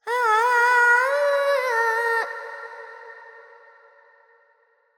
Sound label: FX Phrygian, Carnatic Female Alaps; Sample Banks